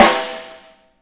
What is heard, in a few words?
snare, LUDWIG SNARE